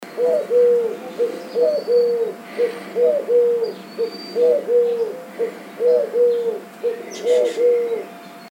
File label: eurasian collared-dove